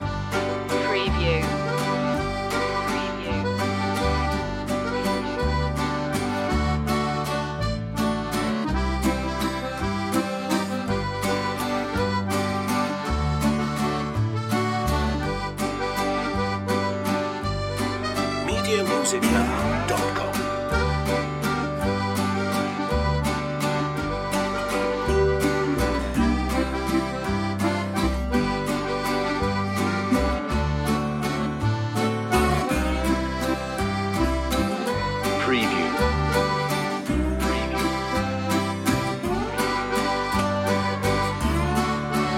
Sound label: cajun bon ami loop